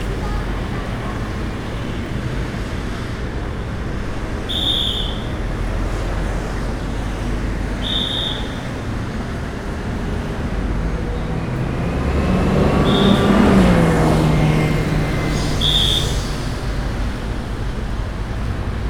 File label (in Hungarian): folyamatosan sipolo rendor